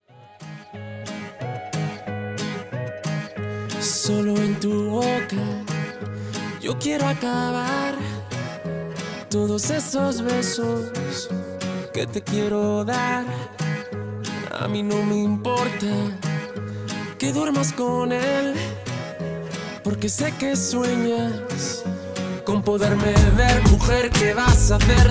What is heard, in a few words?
SALSA